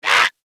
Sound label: pain, zomb kid; nmr